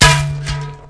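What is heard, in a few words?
grass; Mafie 1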